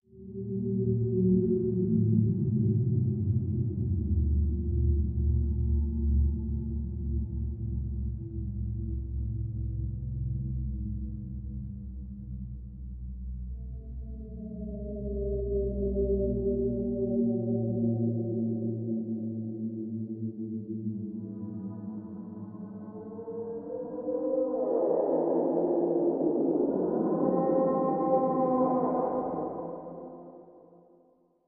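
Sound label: Animal Stinger Eerie; stingers & whooshes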